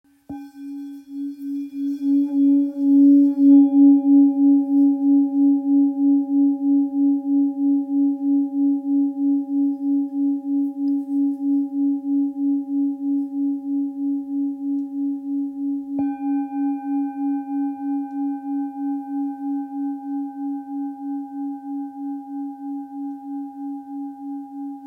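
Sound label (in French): Ancien do dièse 870g, Bol Chantant Tibétain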